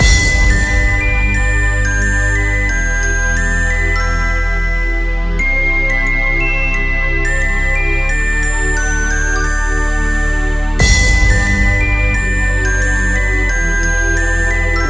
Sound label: hardcore